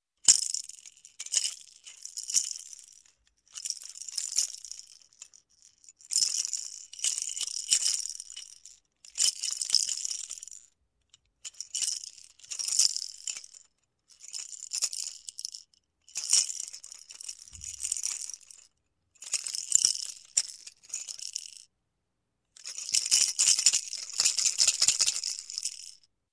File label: Toy Rattle Toy, Rattling; Sound Ideas 2000 Series